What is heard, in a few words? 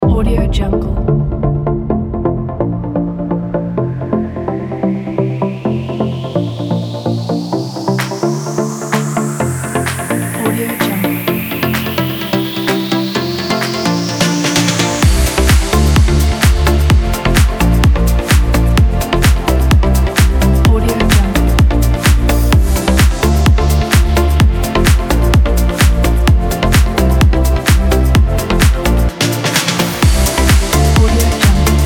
electronics